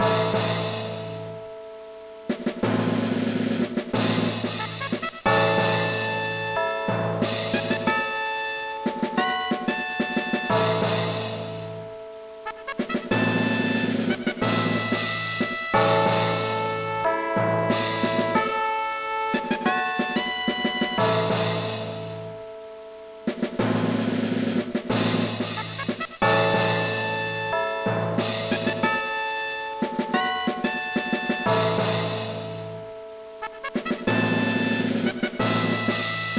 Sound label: battle fanfare; gameboy advance; Advance Wars